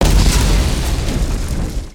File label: strike